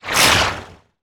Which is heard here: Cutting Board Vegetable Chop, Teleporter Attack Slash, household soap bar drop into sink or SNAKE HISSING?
Teleporter Attack Slash